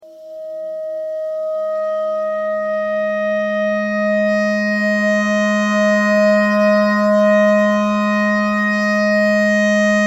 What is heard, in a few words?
clarinet clarinet notes e simple